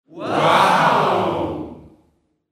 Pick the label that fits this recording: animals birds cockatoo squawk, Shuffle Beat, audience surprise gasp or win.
audience surprise gasp